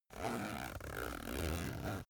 zipper use slow

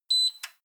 oven off

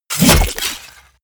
sickle hit smash

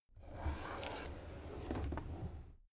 drawer cabinet crammed open soft

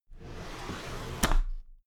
drawer cabinet paper open regular

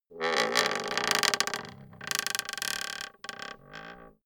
wood creak slow classic

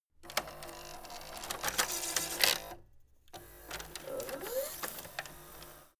servo move

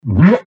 big whale

